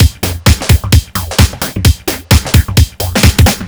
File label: 130 Full, Drum Loops 130bpm; Zero-G; Soundclash Nu Dance Electro; Acidized Wavs; Chicago Love